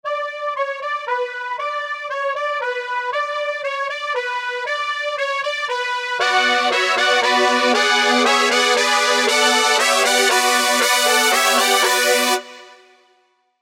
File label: Oberheim Brass; Spire